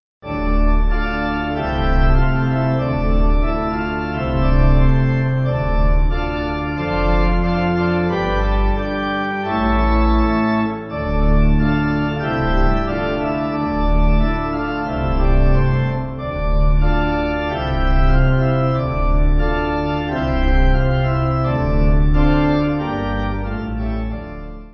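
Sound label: Pipe, Pipe Organ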